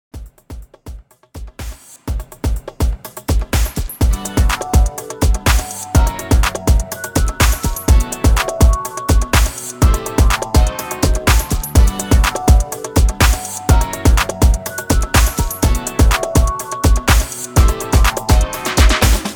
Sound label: REDRUM 124 BPM; LEADER